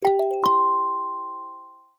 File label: warn